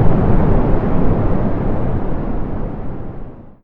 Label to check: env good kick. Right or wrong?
Wrong